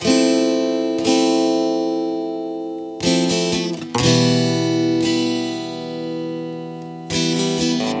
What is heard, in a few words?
Guitar - 120 BPM (A Minor), Acoustic Guitar; Rainfall; GhostHack; Free Guitar And Piano Samples